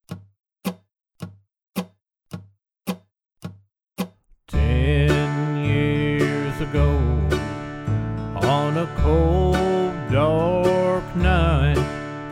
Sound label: Key of D vocal and backing track